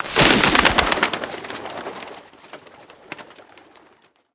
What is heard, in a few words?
bird startle away; animal; modern warfare 2